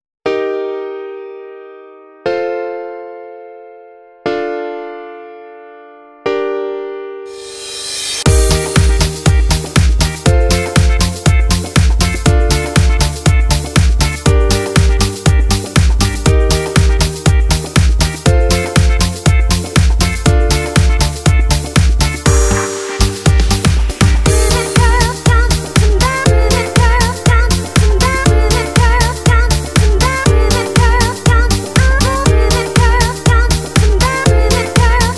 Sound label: chord sample